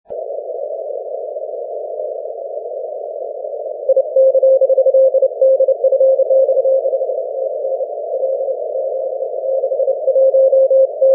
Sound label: 6m cw